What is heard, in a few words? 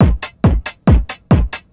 drm 138, techno drum loops; Best Service ProSamples vol.45 - Techno ID